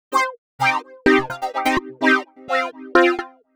Synths, Underground UK House & Electro

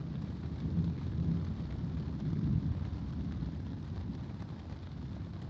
Ambience Loop, outdoor

